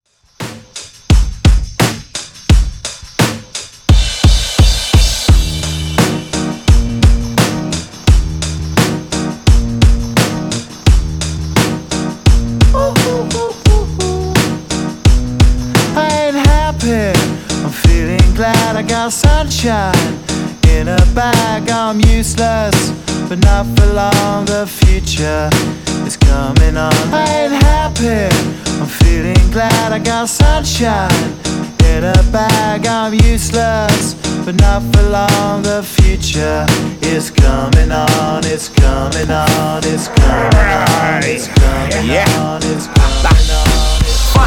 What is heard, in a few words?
Re drum